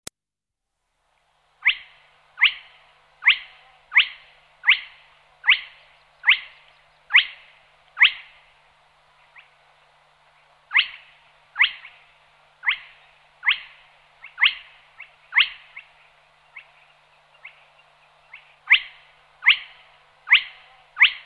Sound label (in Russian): obyknovennyj pogonysh porzana porzana